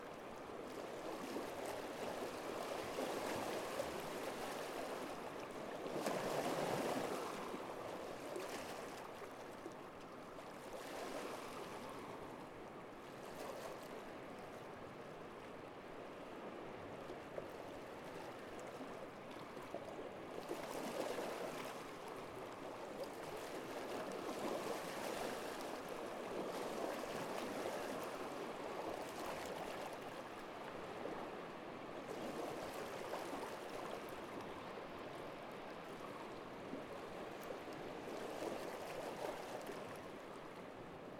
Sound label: Olkhon North Side Tour Waves; natural sounds